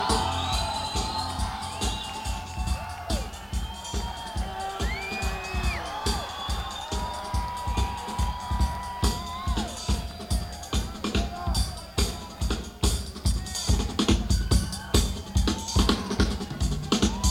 Drums; senn421

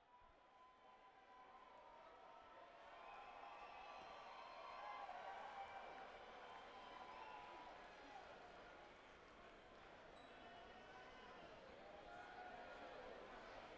Tuning; night

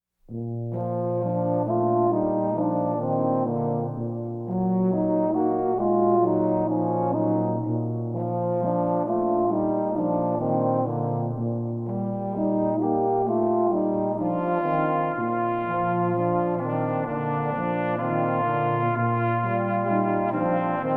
America the Beautiful Instrumental

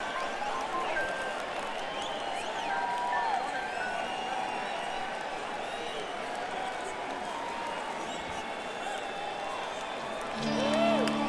crowd; MG210

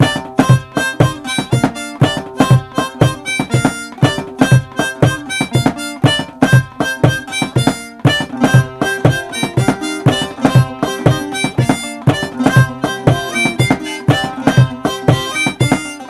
percussion saz harmonica loop

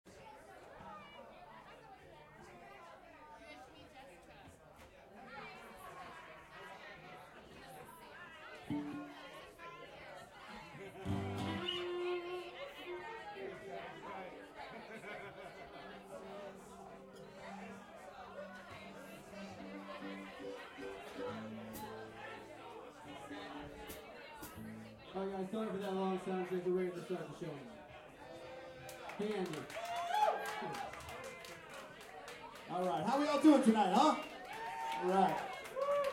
Soundcheck; mosquito; RodeNT5

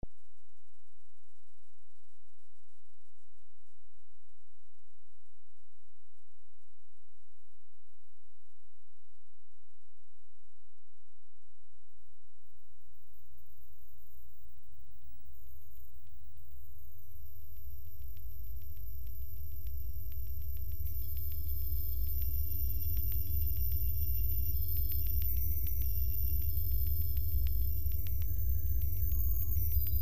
metro noise

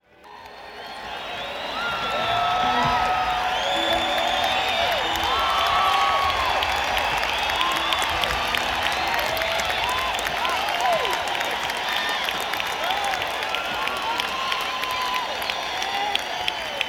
Crowd Tuning; neumann u87

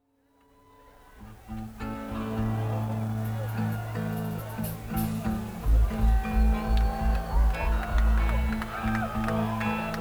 Crowd Tuning; neumann km84i

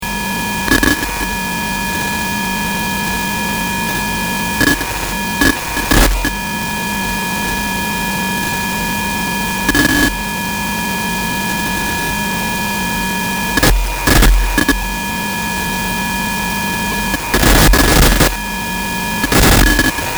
scratching the ac; RawCotton; eucci

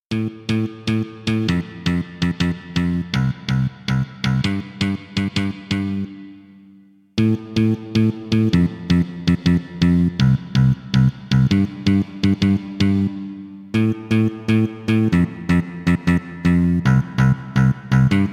Kick Bass, Kawai K1

